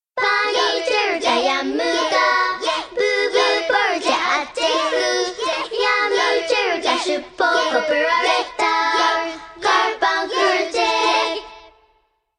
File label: Acapella; Loco Roco